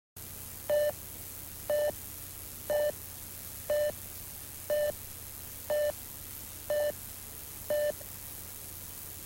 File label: electronic countdown